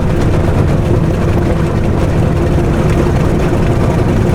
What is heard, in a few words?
digger drive loop; hl2